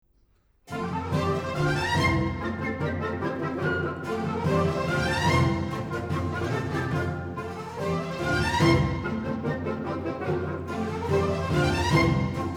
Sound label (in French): Tambourin en rondeau; Tailleferre